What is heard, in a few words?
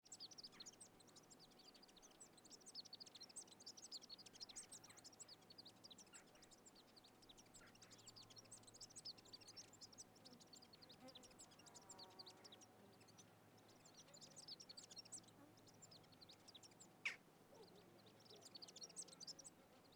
country birds flies; atmosphere